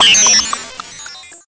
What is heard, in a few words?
digital fragment teleport burst; sound design; zapsplat